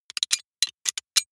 sci-fi handling click

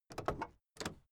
car camaro open door

